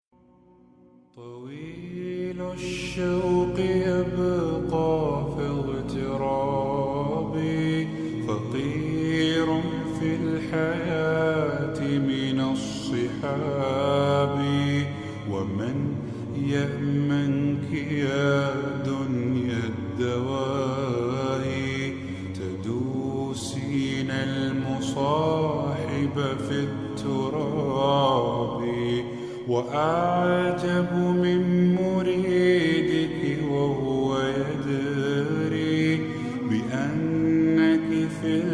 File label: nasheeds slowed reverb